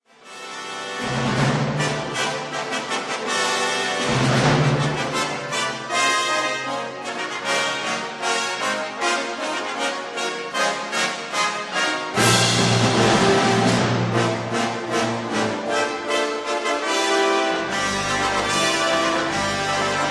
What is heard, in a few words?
festive flourish excerpt; Rozsa